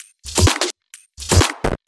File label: House loops 128BPM; Vengeance Samples